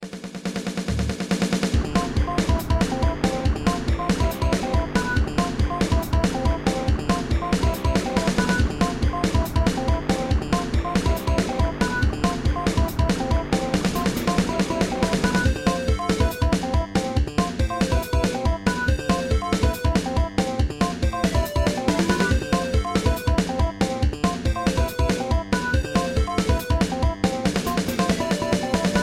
Ring Racer Tones

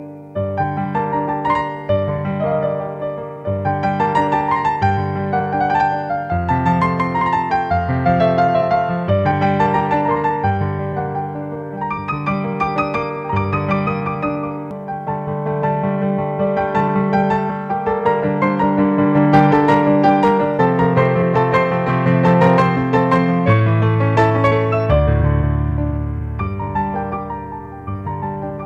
Piano

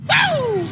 Early Woo

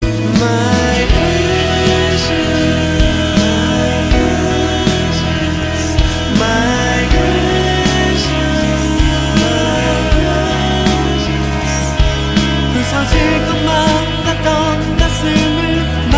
Chorus

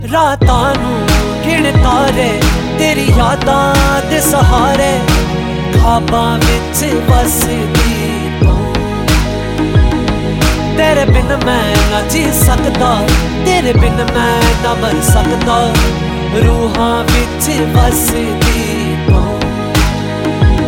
New Punjabi

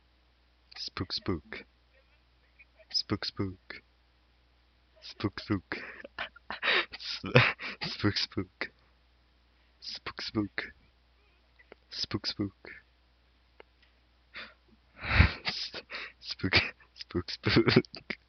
spookspook

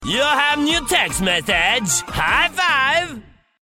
Text Tone

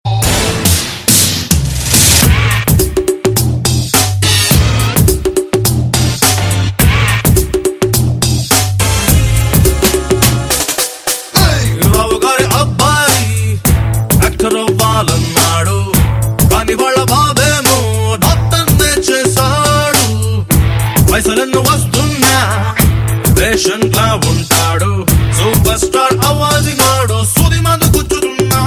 Beats

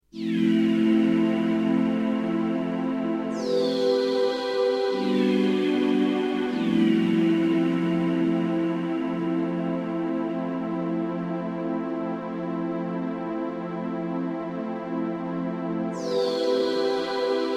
Chillout

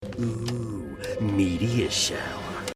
Oooh; meteor shower

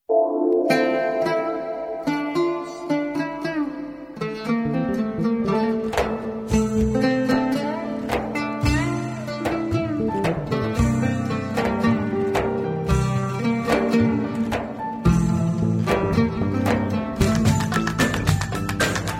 Turkish

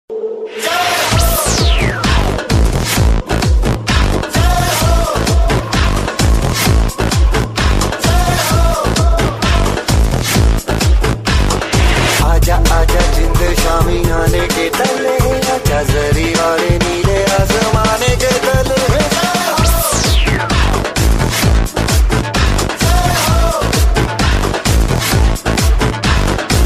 Electro Mix

